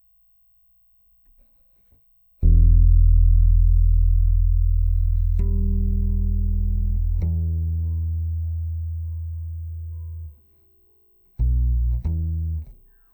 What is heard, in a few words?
C Bass DI